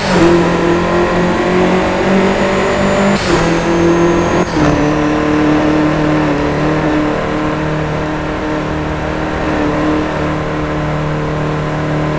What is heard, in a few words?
fourth cruise, vehicles; gmod; tdmcars; vw golf gti 14